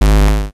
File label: bounce; sigurdrshavoc